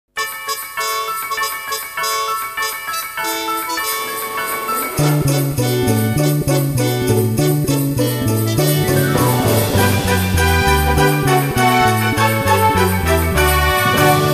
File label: Jingle Bells